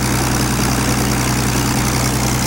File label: Plane P40 Inflight Loop; SFX Kit; Airplanes